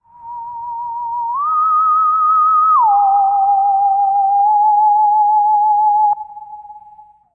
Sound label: verby whistler; WORLD LOOPS